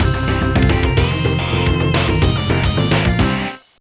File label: Mission Complete